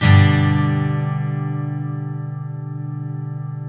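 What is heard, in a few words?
steel G major up, CHORDS STEEL, Guitars; Spectrasonics; Hans Zimmer Guitars VOL-1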